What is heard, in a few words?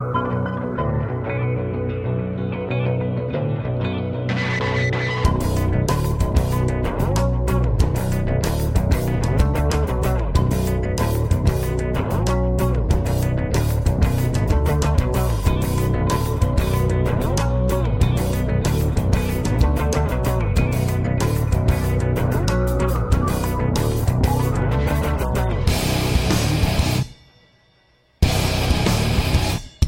Bass; meloboom